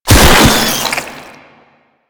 Maggot Pain; Enemies; Vocals; Warframe; Proto Infested

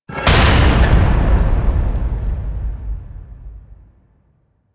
SFX Door Close; Cinematics; Warframe; The War Within; Mountain Pass

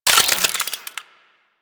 Modular Secondary Handle Slow Reload, Guns; SU Modular Guns; Reloads

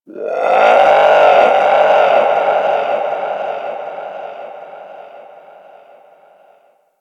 Creature; Ambience; Warframe; Corpus Outpost

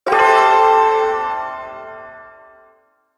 Ersatz Shawzin Maj Chord; Warframe; Duviri Ersatz Shawzin

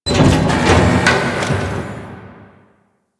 Clunky Machine; Ambience; Warframe; Corpus Railjack

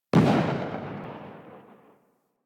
Fire Tail, Weapons; Strun

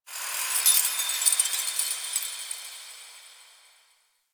Moon Chimes Small, Ambience; Warframe; Orokin

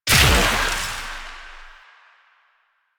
Explosion; Warframe; Weapons; Moa Pet; Multron

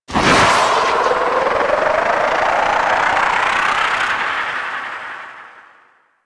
Archwing Healer Engine Boost Slow Reverse; Warframe; Vehicles

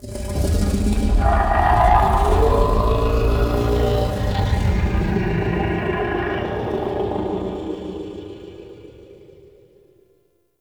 Growly Vocal; Warframe; Man In The Wall

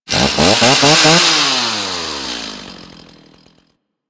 Ghoul Sawman Rev Ambient Attack; Warframe; Grineer Ghouls

